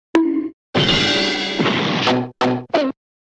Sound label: wrong